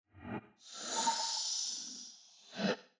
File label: sting hand close airy hissing windy sucking; prosoundfx